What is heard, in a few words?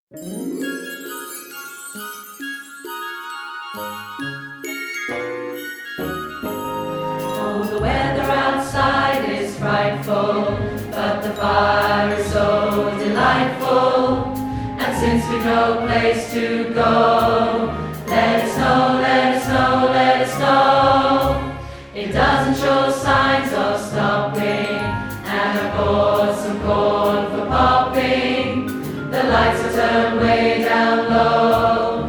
BASS